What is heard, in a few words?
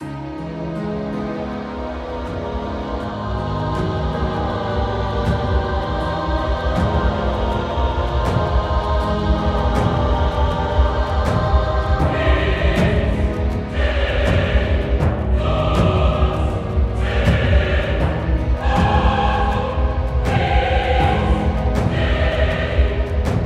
Creepy Music